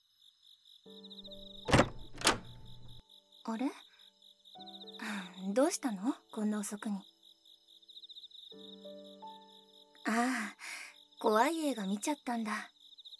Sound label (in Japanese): ある夜の耳かき; ぐっすり眠れる耳かきボイスι